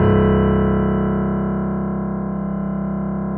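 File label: grand piano C 0; USB Soundscan; AKAI; Keyboards Acoustic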